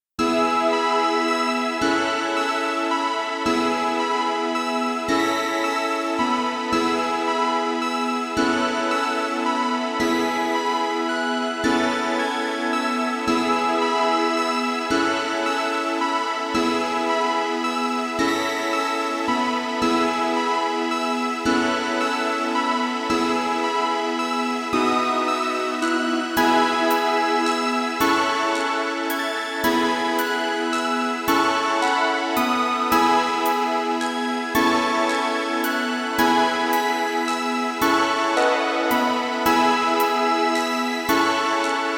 Ambient Ver.

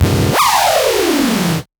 Zap, PsyTrance

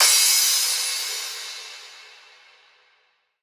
Crash

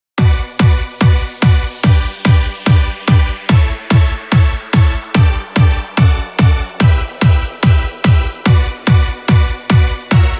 Instrumental; 東方紅楼夢18

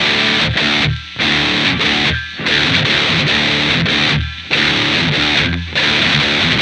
guitar; LiLA'c Records PsyTrance Sounds 01; LRP1 Guitar13

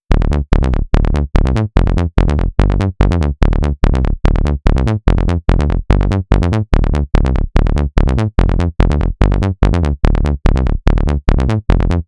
Bass Fullon, PsyTrance

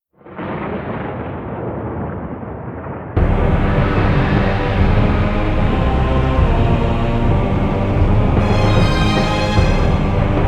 instrumental

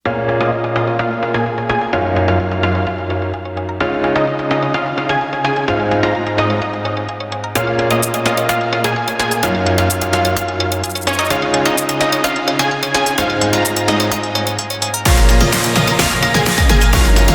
Instrumental

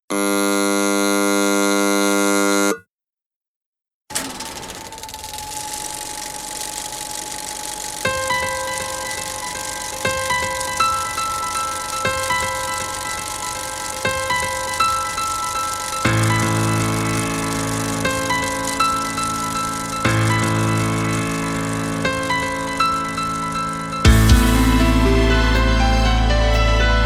instrumental

